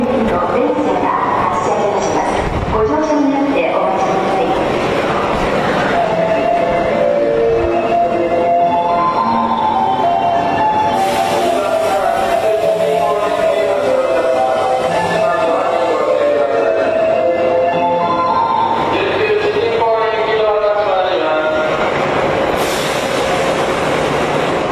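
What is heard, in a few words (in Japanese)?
池袋駅, メロディ; 全てのホームで使用します。; 埼京線; 新宿・りんかい線方面; 2005/3/9まで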